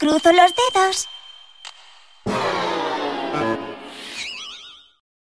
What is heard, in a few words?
lose puzzle; flora